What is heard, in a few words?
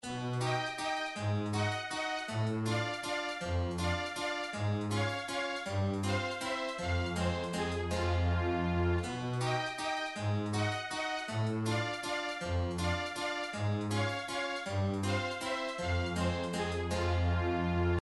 stately atmosphere; super nintendo; sequenced; clue